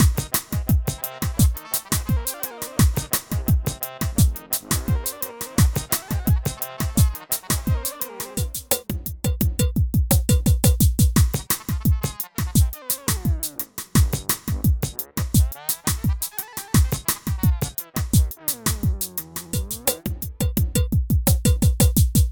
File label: Looney Bossa Nova